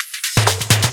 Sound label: Fills 128BPM, Clubsounds